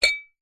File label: household ceramic bowls square x2 pull apart seperate